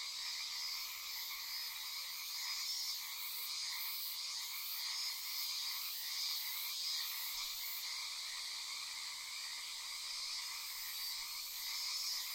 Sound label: night fauna, summer; ambient soundscapes; dota